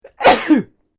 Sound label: MALE SNEEZE STRONG; Male Vocal sounds; Sneezing (Sound Effects:Human)